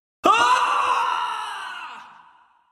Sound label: woahhhhhhhhhhhhhhha; genji